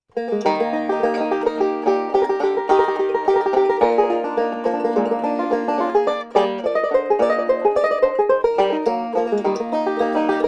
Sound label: Clarinet Polka; Jazz